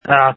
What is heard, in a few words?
scream